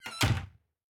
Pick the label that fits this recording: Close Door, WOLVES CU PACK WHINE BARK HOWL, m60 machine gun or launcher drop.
Close Door